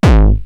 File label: Roland TR-909 BD; BD Selection